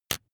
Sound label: razor fold out; maschinen; rasierer